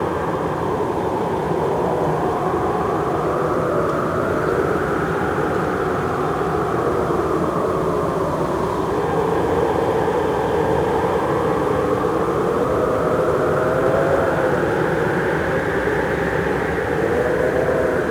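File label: wind medium; WAR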